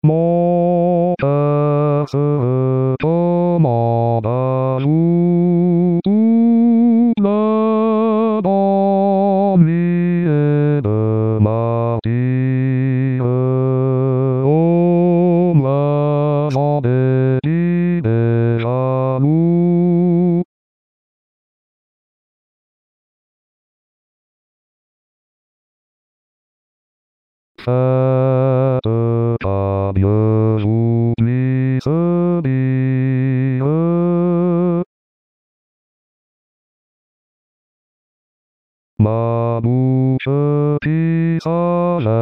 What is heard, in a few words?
bass; Lassus; Mon Coeur